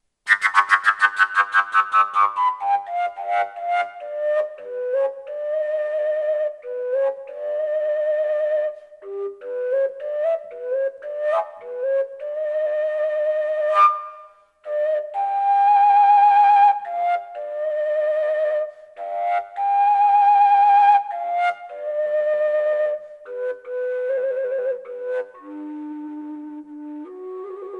fujara ashen dark; natural fujara

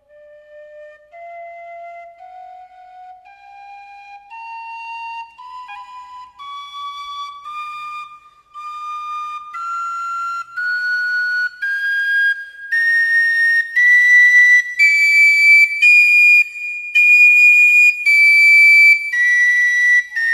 scale dur, melodic fujara, whistle

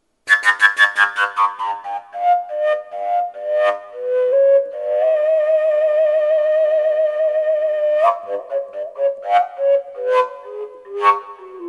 locust, natural fujara

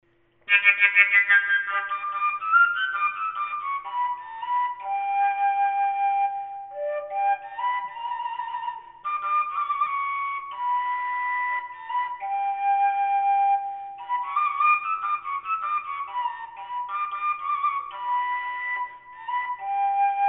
koncovka g2, overtone flute; whistles

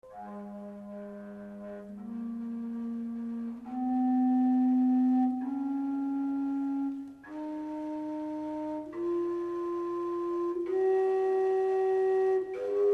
scale, melodic fujara

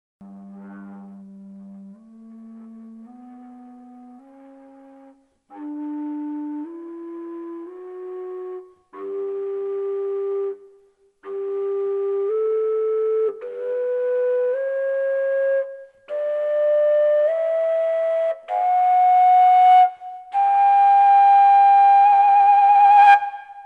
fujara ashen dark scale; natural fujara

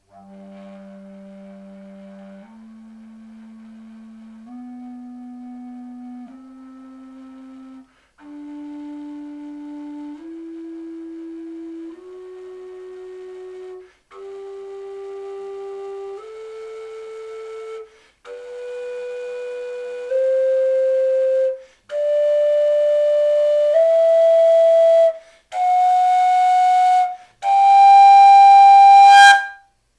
fuyara hand bored 2parts G scale; folkart slovakia

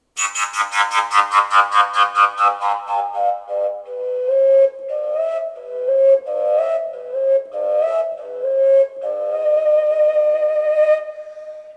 sulik collapsible g; folkart slovakia

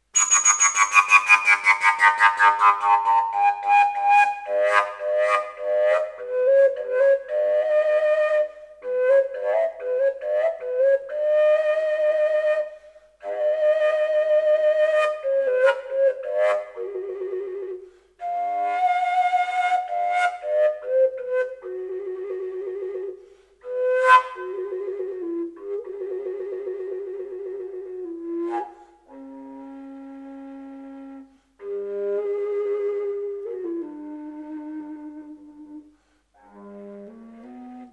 fujara; vanek

